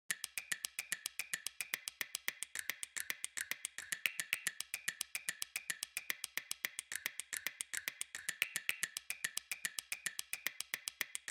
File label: snap